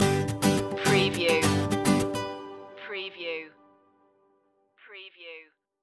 coffee break stinger

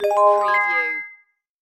Multimedia Chime

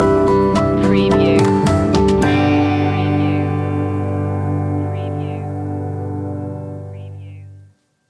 our last night stinger

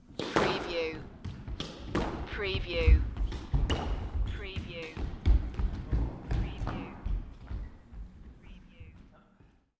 Sports Squash Rally

